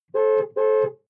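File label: sports car interior horn rhythms short; verkehr-transport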